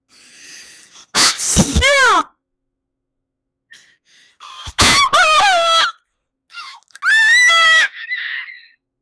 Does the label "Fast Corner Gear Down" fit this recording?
No